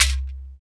CHEKER, ETHNIC PERC; Roland LA

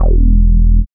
BASS, Tekno Industrial; East West

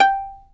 fiddle pizz G5 R; Sampleheads; Whole Lotta Country 1 & 2

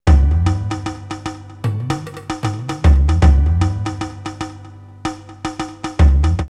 Windhoek 76, drumloops World Ethnic; X-Static Goldmine

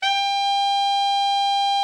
TENOR, Sax; Giga Samples Collection

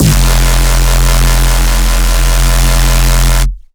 NEURO PUNCH REESE, bass

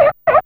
Seal; Fairlight CMI IIe

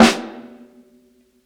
60s SNARE LOUD; Basement drums vol 2

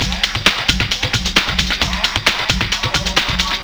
housefunk radio wave; Sonic Foundry (Sony Creative Software); Ugly Remnants VOL-2

